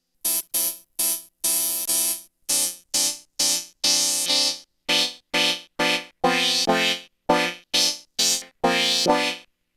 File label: piano mouse, HardSID